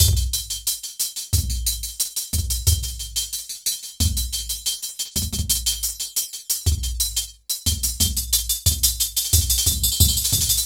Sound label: squarechops 090bpm, downbeat dance grooves; Zero-G